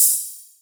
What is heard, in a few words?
Ride, Cymbals; Vengeance Samples